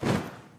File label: thud swoosh